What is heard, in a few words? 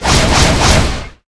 Triple Hit; Seer; GamigoNA